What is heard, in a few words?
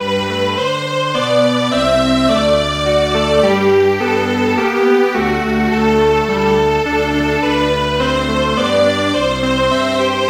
Strings Quartet; We love the heroes